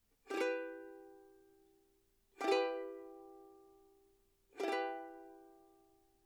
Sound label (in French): Ukulele Em Accord